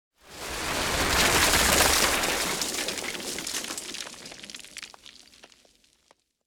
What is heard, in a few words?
bomb material ground; War Thunder